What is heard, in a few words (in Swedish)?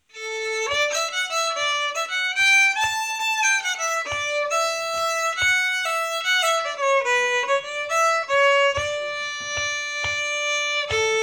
Julvisa från Älvdalen; efter Tomas Hedvig Persson